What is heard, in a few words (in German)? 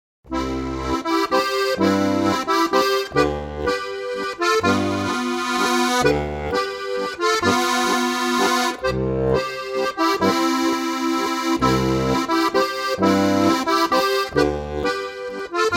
Walzer